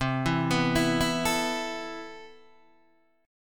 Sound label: C Major 13th C, Guitar Open D